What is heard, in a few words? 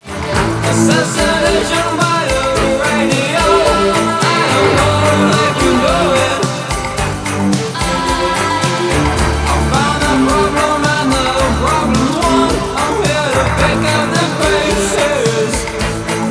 my breathing